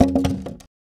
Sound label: Drop Wood; FREE SFX PACK VOL 3